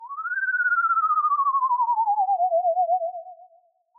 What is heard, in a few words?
tone; Halloween; seasonal